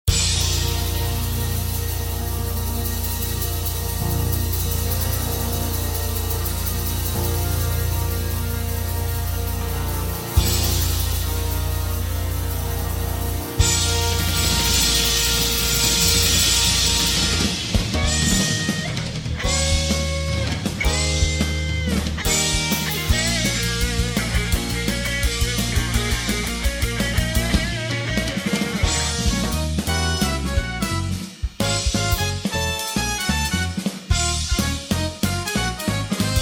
Db No Backing Vocals, backing tracks

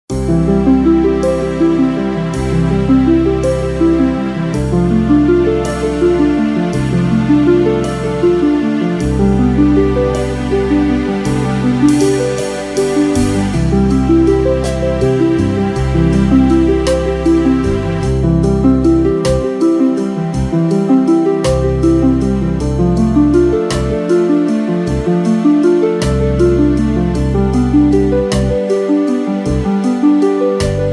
(C To D) No Backing Vocals

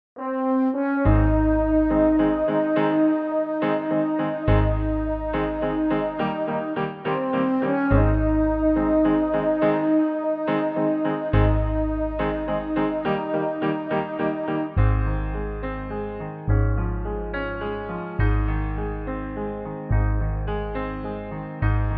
No Backing Vocals

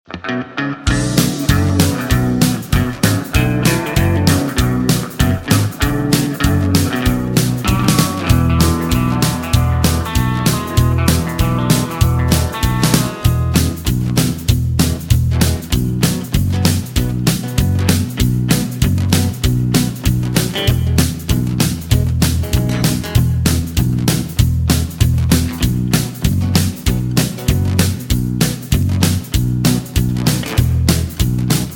E No Backing Vocals, backing tracks

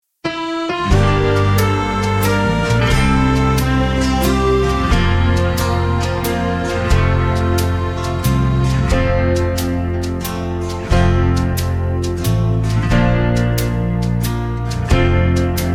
(F) No Backing Vocals

